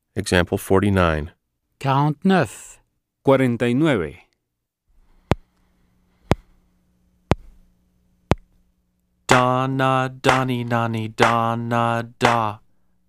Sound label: Keyboard